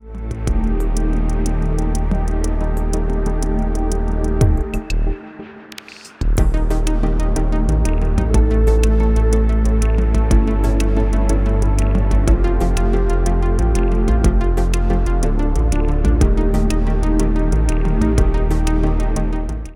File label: Yamaha Genos Style Jam